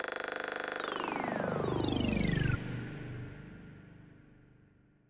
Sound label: Stab